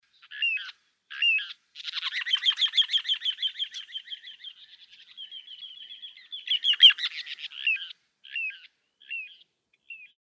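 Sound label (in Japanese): kochidori song, little ringed plover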